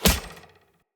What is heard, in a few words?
Hunter Bow Arrow Impact Armour; Weapon Impacts; Bows; Envoy; Combat